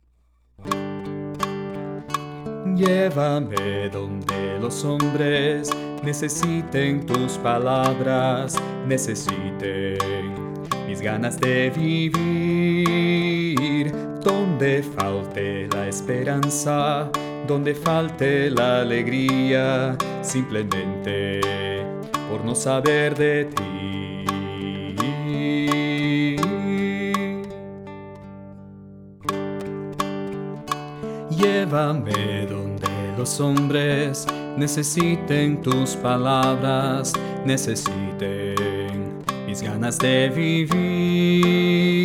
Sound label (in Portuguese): Baixo